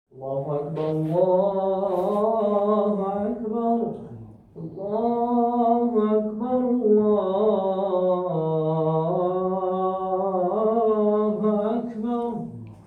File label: Ezan, Solo